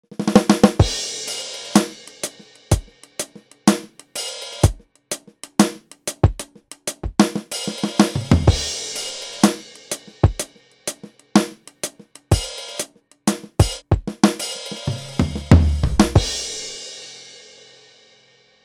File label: Vinyl Dark